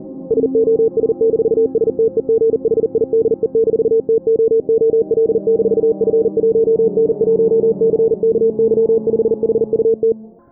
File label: Signal track morse filtered